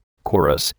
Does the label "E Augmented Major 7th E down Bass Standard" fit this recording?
No